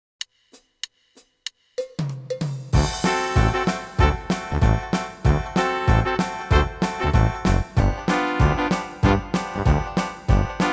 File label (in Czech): doprovod pro sopranovou zobcovou fletnu